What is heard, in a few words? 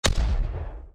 Cannon shots; SNKRX